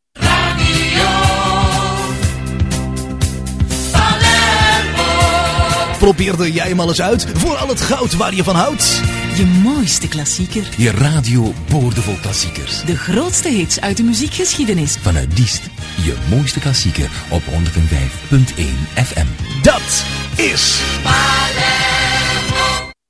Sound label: jingle; Palermo